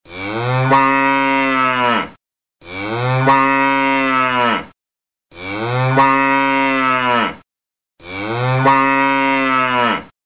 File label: cow